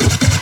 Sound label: Scratching FX